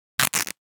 Cloth Split Rip Tear - Quick Sharp - Foley Cloth Movement; Hollywood Edge; sound designer's toolkit v2